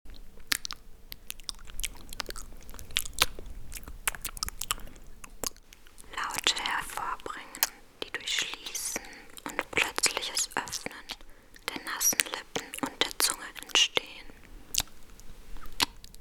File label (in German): schmatzen